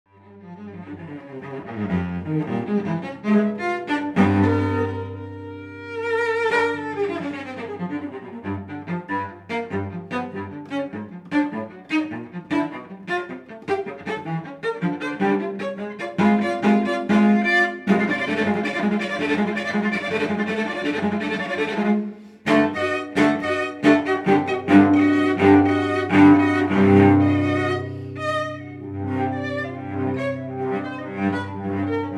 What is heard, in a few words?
violoncello